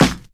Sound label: short snare single hit b key, snare drum sample tuned to the B note; snare drum samples